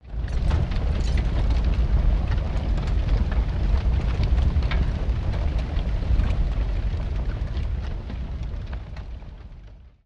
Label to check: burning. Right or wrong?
Right